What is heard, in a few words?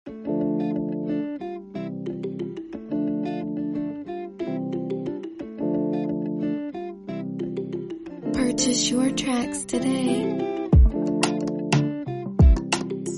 Afrobeat Instrumental